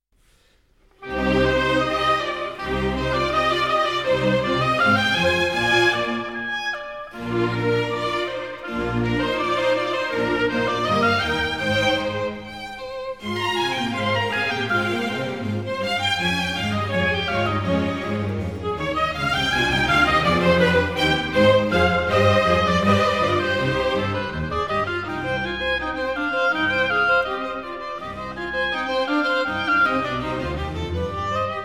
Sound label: Concerto For Violin Oboe; Bach